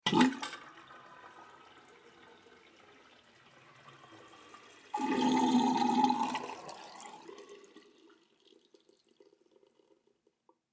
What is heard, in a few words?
Water Sink Drain; Bathroom Sound Effects; Bathroom (Sound Effects:Household)